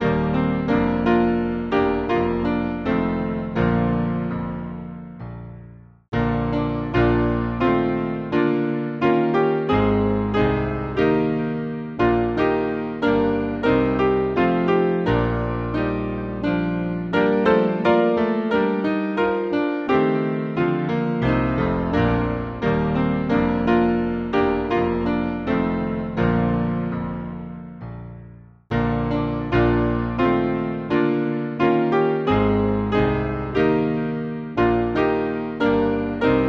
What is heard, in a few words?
Hymn, piano; McKee